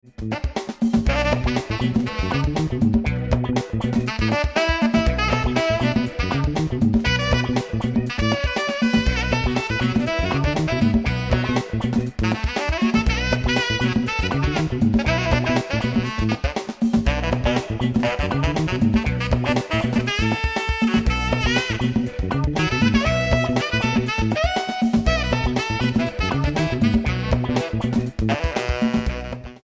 Funk

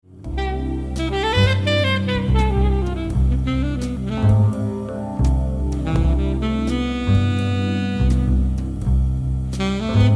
Song Alto Drake Ceramic

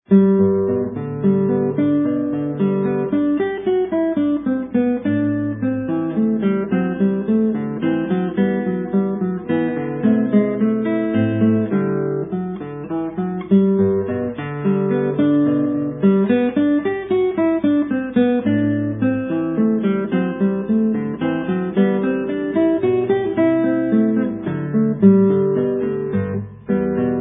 andante, guitar

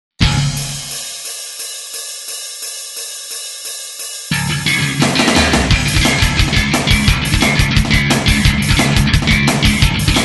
drum bass

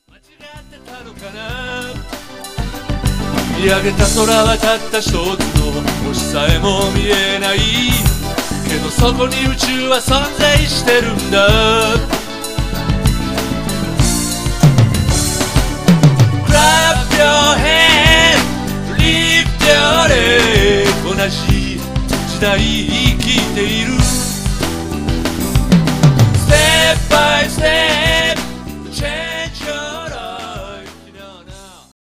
clap

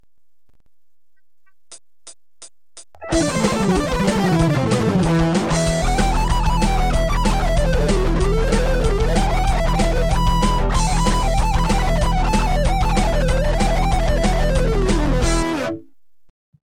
daddy solo

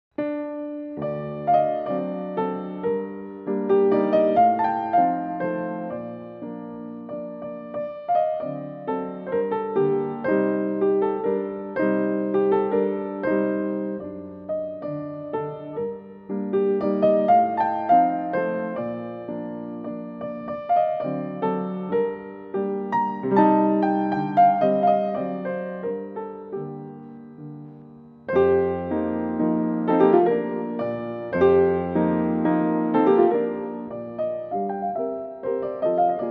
Chopin Mazurka g-moll

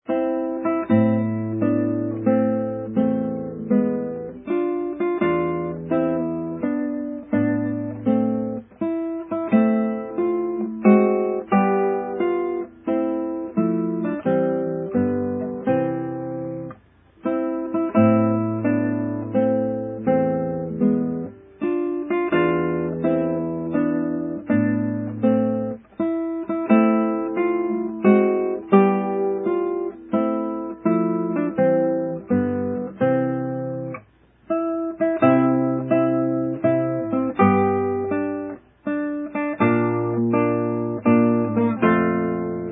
larghetto, guitar

